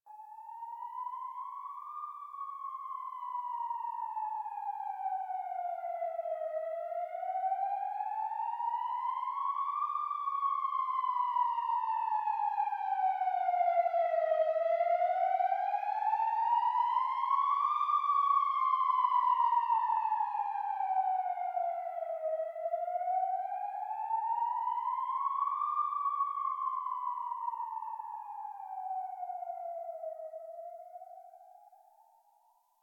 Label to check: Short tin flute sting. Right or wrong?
Wrong